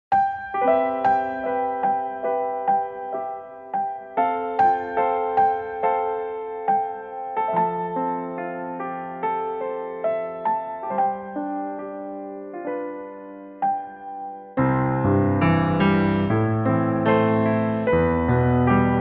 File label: piano